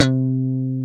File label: GTR Funky Strat, Funk & Mute; Club-50 - Foundations